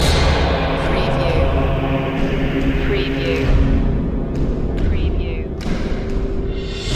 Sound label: heroic loop